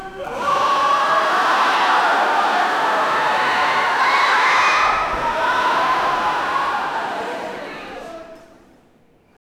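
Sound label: indoor swimming pool crowd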